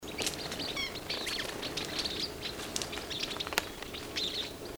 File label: Taiwan Yuhina; Yuhina brunneiceps; call